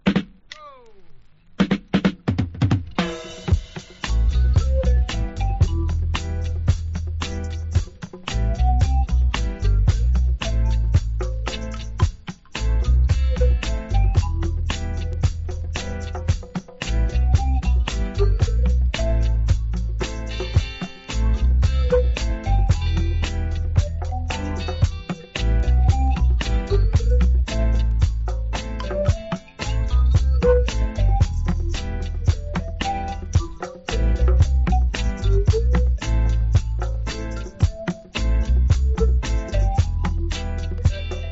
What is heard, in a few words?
Roots Dub